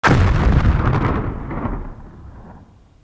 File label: supergun, modern weapons; golgotha